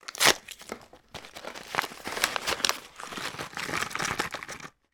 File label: food candy lozenges packet open shake candy into hand; fishermans friends; zapsplat